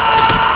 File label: car horn